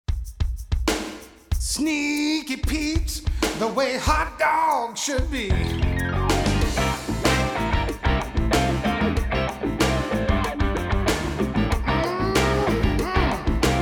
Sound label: Jingle